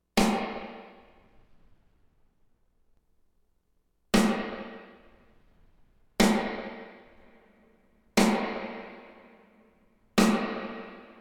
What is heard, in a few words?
hall reverb snare shortest to longest decay